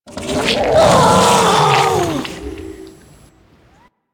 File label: Attack, Infested; Barks; Warframe; Ancient Shell Agent